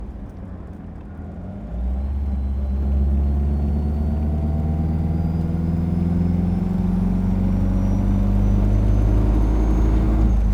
MG TF1500 Country drive Cabin front seat roof open; anno 1954; On The Fly Audio